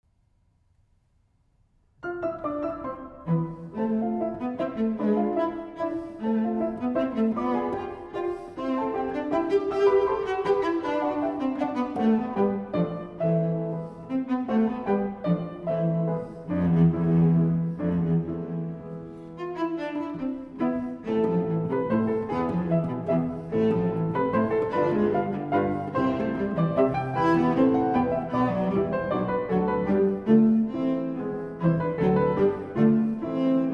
Cello Sonata No. 1 in E Minor, Allegretto quasi Menuetto